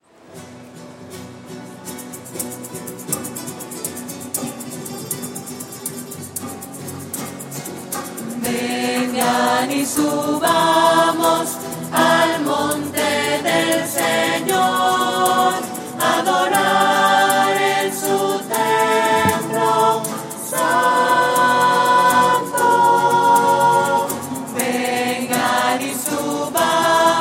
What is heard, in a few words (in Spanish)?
vengan y subamos voces